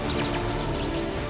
crackle

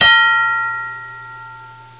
ding

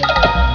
buzz in; usa

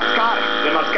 buzzer

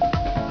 category ding

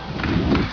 clear chyron; usa

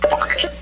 illegal clue; usa

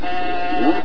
old buzzer; wof

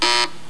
wrong

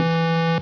ringin

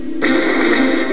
show card; usa

